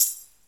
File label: normal hit whistle; osu